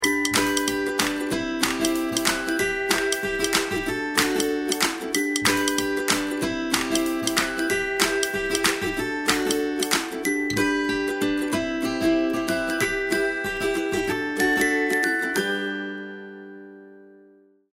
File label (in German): jingle; langversion